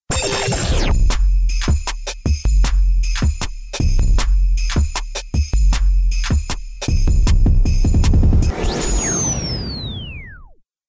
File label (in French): Base rythmé